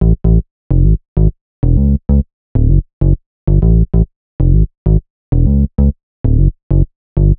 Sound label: Bass Loop 130 Amaj; Bass Loops 130bpm; Downtown House